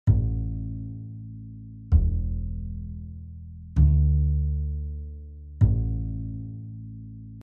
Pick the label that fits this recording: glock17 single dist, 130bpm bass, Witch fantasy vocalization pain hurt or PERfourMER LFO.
130bpm bass